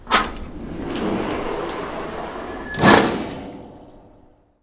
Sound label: GARAGE, DOORS